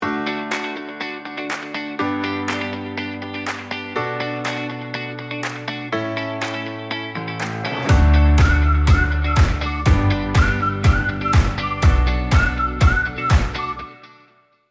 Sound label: music